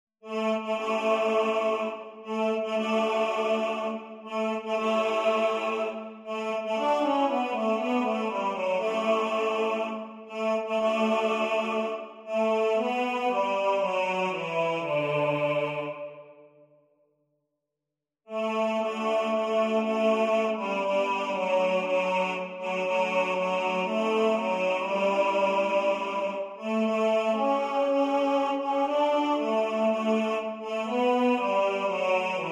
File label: BASS